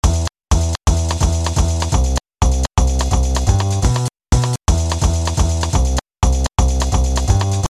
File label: high bass